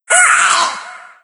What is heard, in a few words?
pain, cherub; doom3